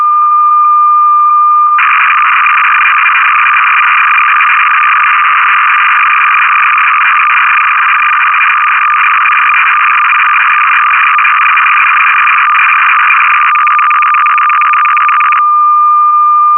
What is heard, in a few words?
Oberheim OBXA factory presets; Synthesizer Factory Patches; Cassette Interface